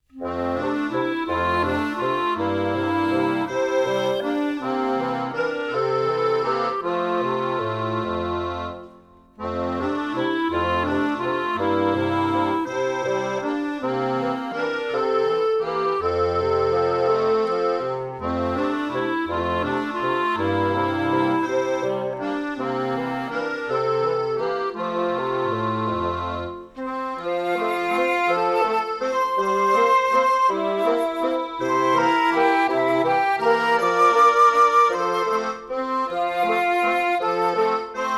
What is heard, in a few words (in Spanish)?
musica solo; cristo me manda que brille